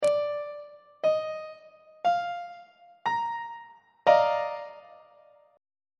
e flat major 7th suspended 2nd 3rd inversion on treble clef